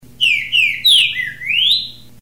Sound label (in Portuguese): Canto Liso